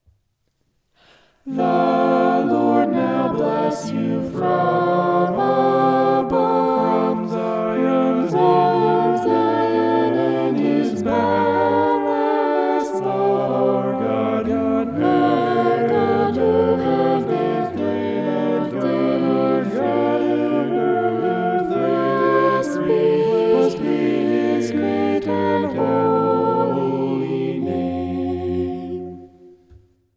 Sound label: vocal poly; psalm 134; Ps134